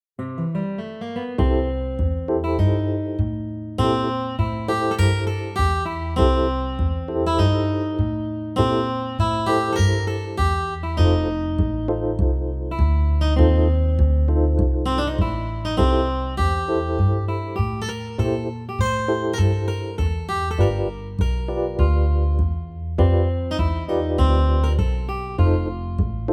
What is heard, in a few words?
MIDI blues